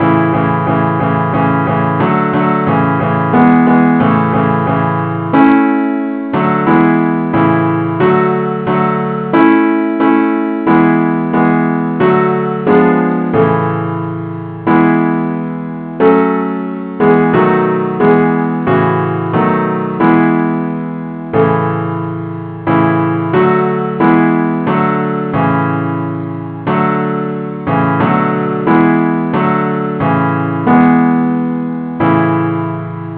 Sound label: simple acc key a; christe Sanctorum